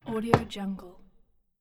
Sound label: wooden storage bench close; stormwaveaudio